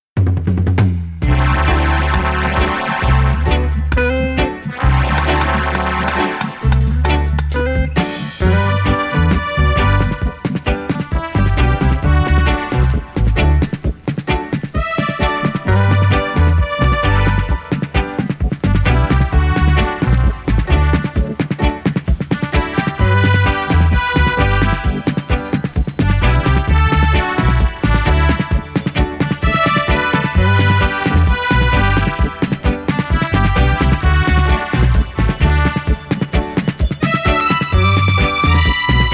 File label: Creative Rock